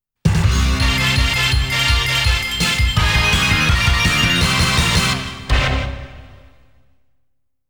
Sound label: Victory BGM; konami amusement sounds 93 autumn